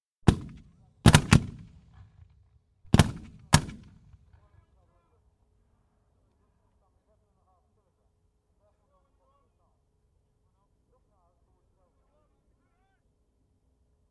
81mm medium mortar, firing multiple high explosive charges, explosions after 0m19s (Recorded close to firing end); BBC